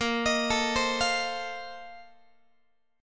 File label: A sharp Major 7th Suspended 2nd Suspended 4th A sharp Guitar; A#M7sus2sus4; arpeggiated; JGuitar